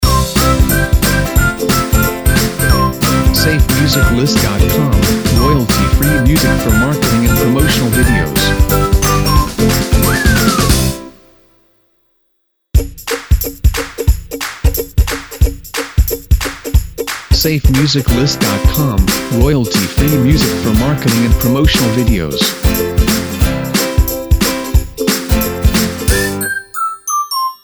Happy Upbeat Video Bumpers; SafeMusicList

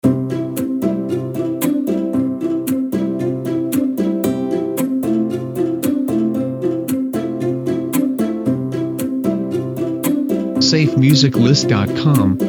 Relaxed Happy Loops

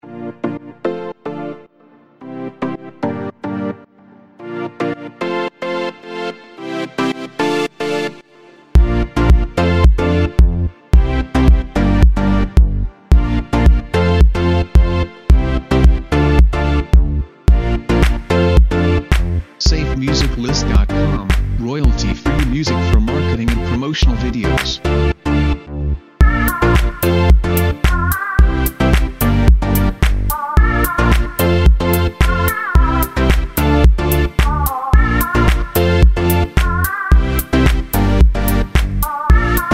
Fashionable; SafeMusicList

